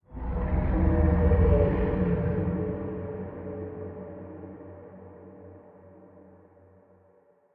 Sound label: amb unknown creatures kaiju; Sfx amb unknowncreatures kaiju1